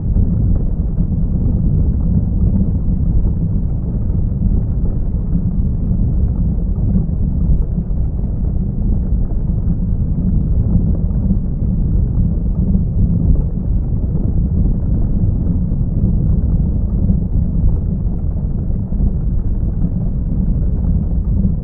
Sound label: ambience disaster rumble